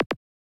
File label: zap, grime, 140bpm; Zero-G Sound Sense Grime Scene; GS Construction Kits; Its Primetime